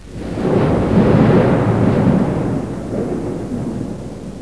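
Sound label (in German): Donner, thunder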